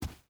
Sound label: footstep boots sand run; Rust